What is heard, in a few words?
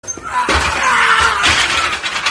squat fail